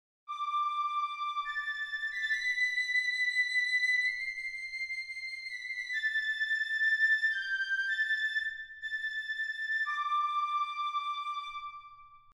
ottavino

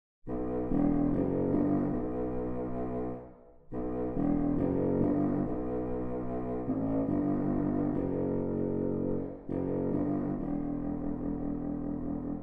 Controfagotto

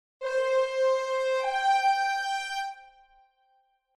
quinta giusta

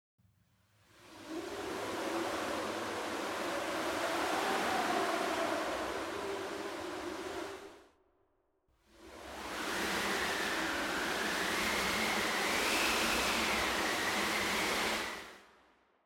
percussioni macchina vento

